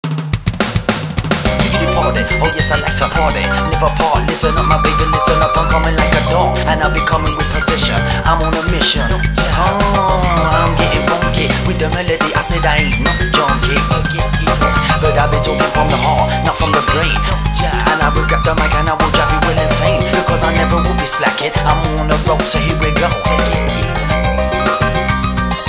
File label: Funky Lounge; SKY IM-S330K; Funky Groove